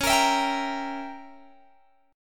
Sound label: C sharp Diminished 7th C sharp down Guitar Open G